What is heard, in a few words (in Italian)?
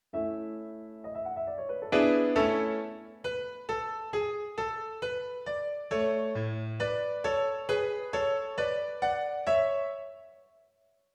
Allegro